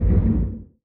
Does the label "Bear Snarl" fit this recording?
No